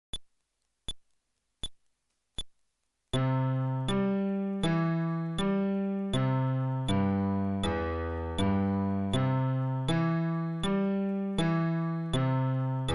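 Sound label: Arpeggio テンポ80, フレットレス bass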